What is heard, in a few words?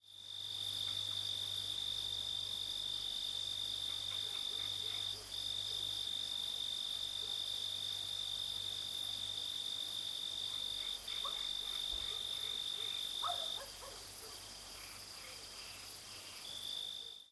ambient cricket; STALKER